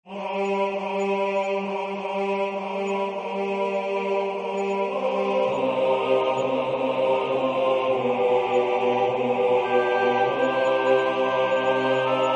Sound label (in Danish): bas; Skal blomsterne da visne